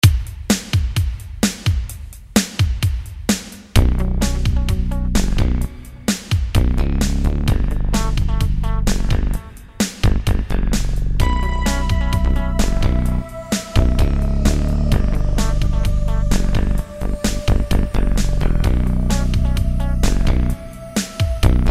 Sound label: Dance